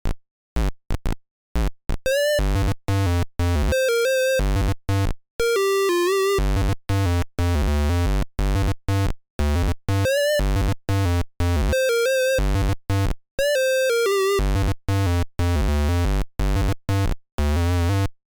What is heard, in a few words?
PC Speaker, mono synth; reaper